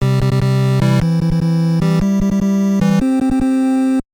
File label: Pinball Game Start; animal crossing full gamerip